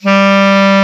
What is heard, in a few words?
KLARINETT G, Alpine Volksmusik